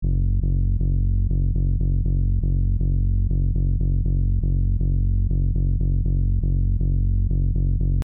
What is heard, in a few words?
Bass; PatchFactory